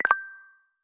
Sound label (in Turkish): cift ses; Çift Ses2